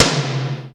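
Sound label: POWER KITS, tom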